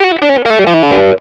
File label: system shutdown; jam; stereo